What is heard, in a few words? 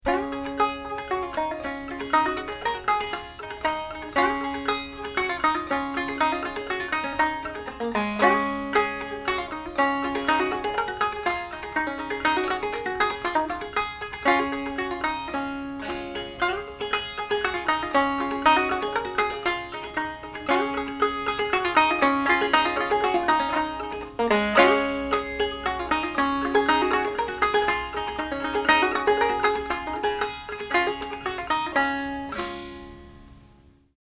banjo